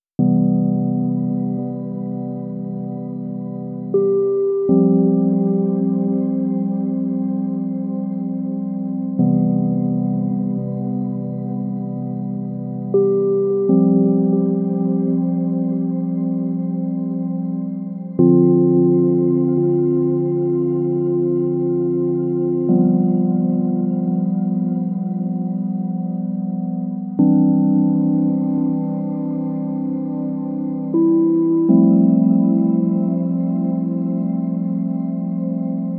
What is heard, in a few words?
mountain stream stems; airtone